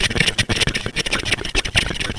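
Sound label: spider walk; eqclassic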